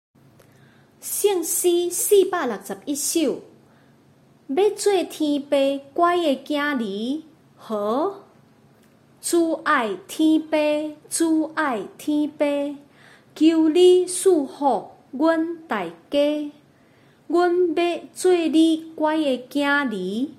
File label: Chorus, children; gods loving child